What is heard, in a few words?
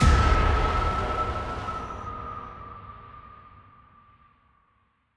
Ghost Jump Scare; Devour; The Town; Intro Sequence